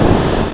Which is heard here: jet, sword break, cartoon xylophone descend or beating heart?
jet